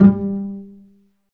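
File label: strings, contrabasses pizz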